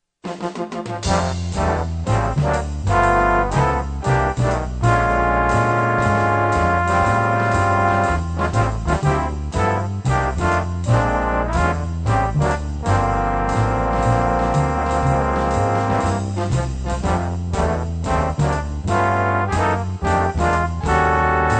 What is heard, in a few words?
trombones